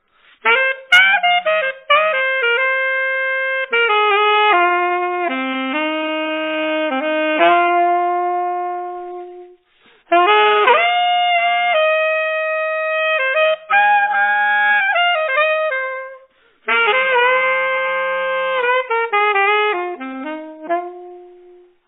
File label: saxophone solo ringtone instrumental